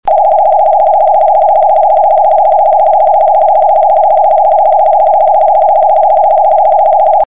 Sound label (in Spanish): zumbido